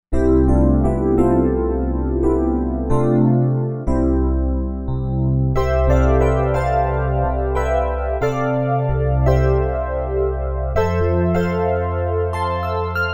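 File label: Piano Split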